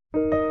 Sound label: Meditation Song; Magnificat; THE SANCTITY OF LIFE SCRIPTURAL ROSARY